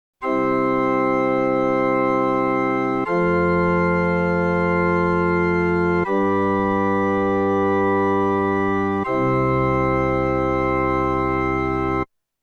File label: organ just tuning